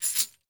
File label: Tamborine; percussion; Cymatics - Infinity Beta Pack 2.0; Zeus Percussion Collection